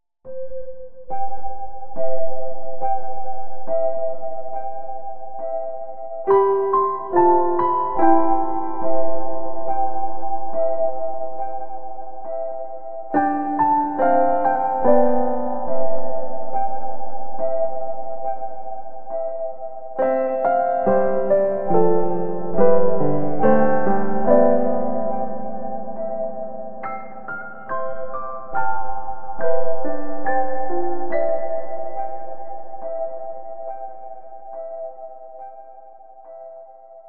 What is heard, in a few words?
Second Theme Table Piano